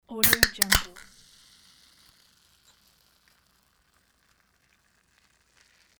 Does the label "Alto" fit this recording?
No